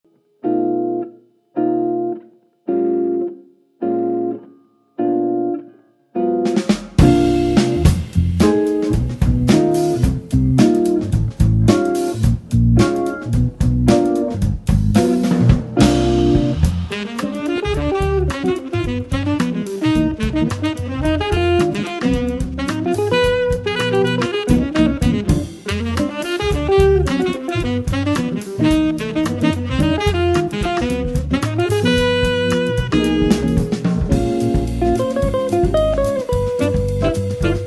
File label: organ funky